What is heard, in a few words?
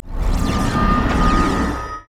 mariner bomb; LoL; fizz skin04